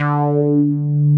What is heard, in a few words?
DIGALO D4M, DIGALOGBS